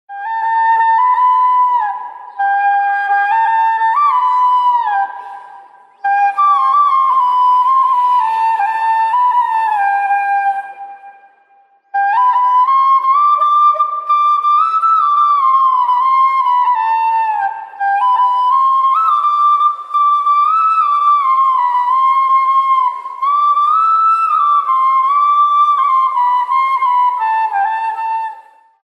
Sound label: Flute